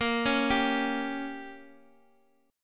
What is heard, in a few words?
A sharp Minor 6th, down